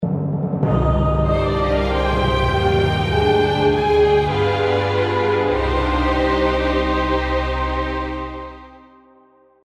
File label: Death and Failure, video game; golden thread video game